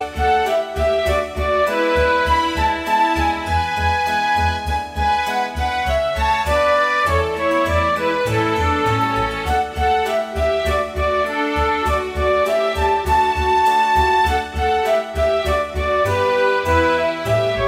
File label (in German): Tischmusik